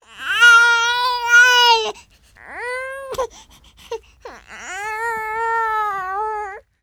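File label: TALK, baby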